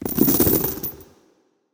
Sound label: Steel Chain Soft Drop